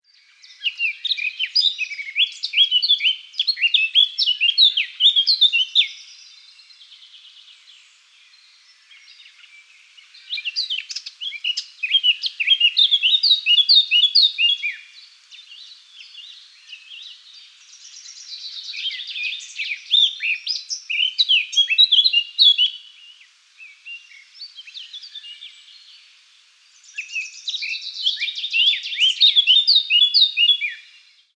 Sylvia atricapilla

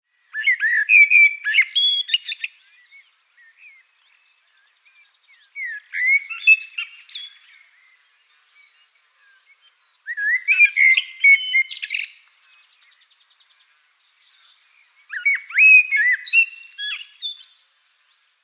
Turdus merula

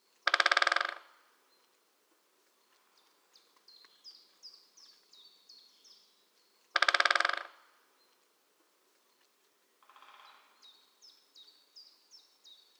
Dendrocopos major drumming

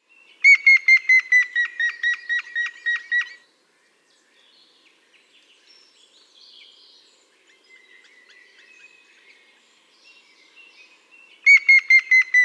Picus canus

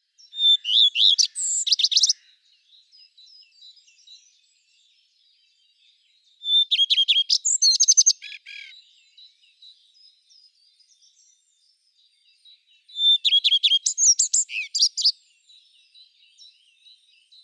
Phoenicurus phoenicurus

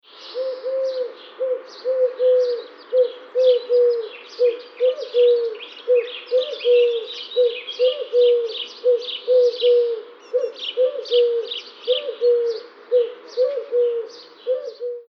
Streptopelia decaocto

